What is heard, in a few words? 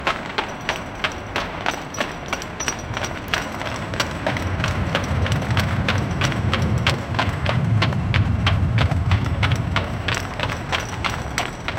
the distant sound of thou